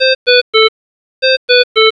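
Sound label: oxygen high, alarms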